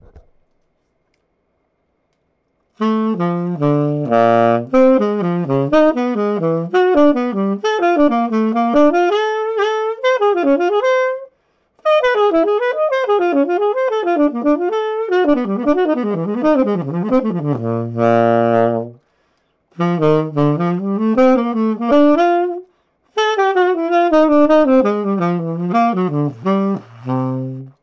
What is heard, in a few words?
horn